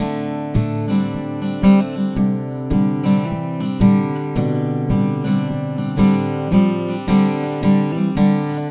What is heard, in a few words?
Acoustic Guitar; Guitars